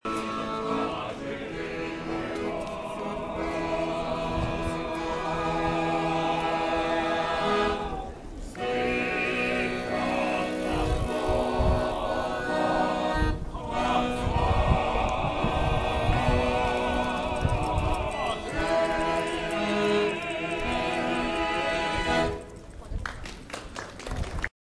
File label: russian music